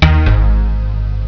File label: pluck